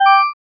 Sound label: answer